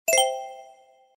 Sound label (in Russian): ideya zvuk